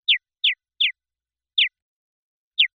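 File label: Bird Chirp Alien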